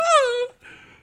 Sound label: ahh